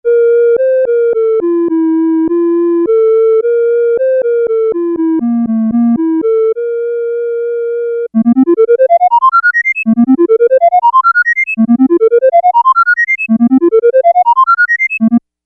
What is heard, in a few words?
Flute